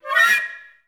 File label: FL FX, Advanced Orchestra